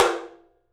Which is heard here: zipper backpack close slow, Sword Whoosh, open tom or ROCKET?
open tom